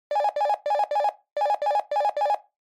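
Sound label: Handheld Transceiver Signal Transmit Call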